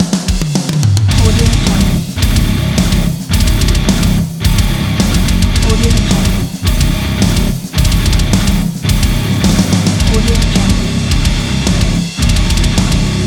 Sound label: extreme metal bass logo